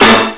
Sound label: orchester